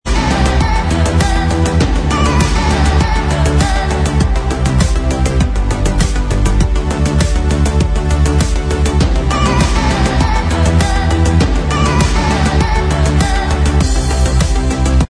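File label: Inst Track, instrumental track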